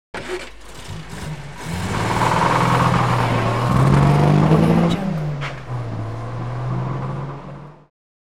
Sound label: vintage car starts up and speeds away; hollywoodedge